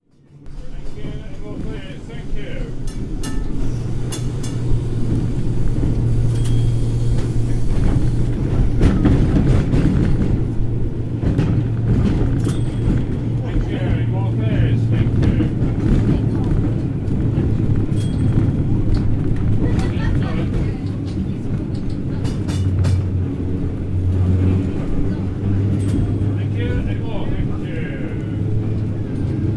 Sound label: Tram journey; Working Life